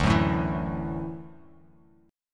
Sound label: D-Major Add 9th D down; strummed; JGuitar